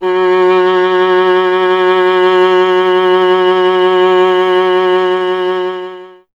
viola F0, Solo Instruments; Miroslav Vitous; Viola 1

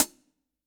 Acousti Hat Hit, hihat; ultimate hihat; Hits; AcoustiHat B

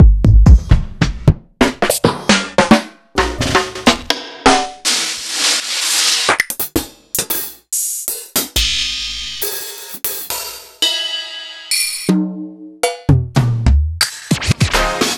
Kit; proteusvx

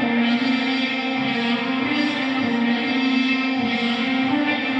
Hondo Fuzz FX 100 C, guitar drone

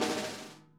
SNARE, Interactive Drum Kits; Sonic Reality; ID SNARE 3+

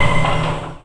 RAY MACHIN; MACHINES

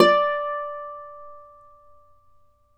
Celtic Harp, Plucked Strings

